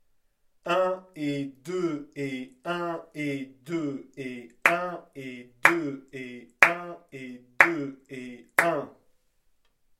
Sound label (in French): contretemps onbeat